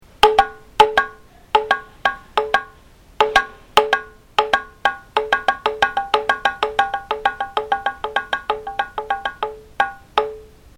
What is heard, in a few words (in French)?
tambour